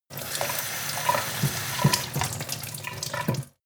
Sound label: sink water pot medium